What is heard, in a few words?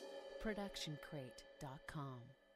crash cymbal 16 inch; soundscrate